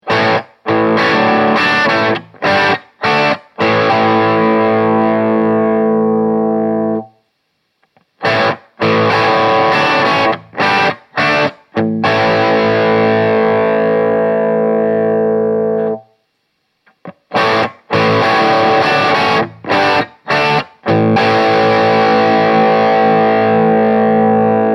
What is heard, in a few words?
Blues 3 Tube Stages 1 5